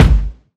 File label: thumpy steel kick drum g key, kick drum sound tuned to the G note